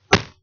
falling

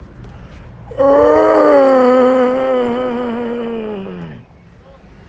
awwwwhhhhhhggg

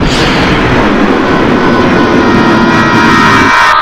jumpscare; old freddys a place of fun

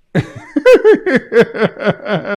nervous laugh

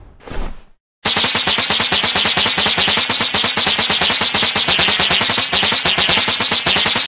slingshot

